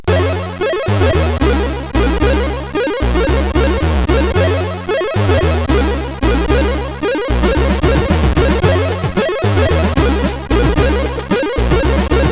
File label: Interlude, CSG8580R5